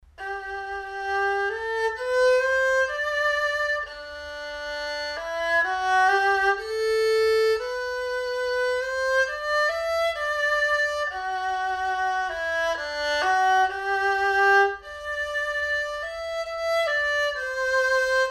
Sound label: erhu